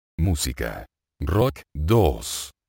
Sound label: rock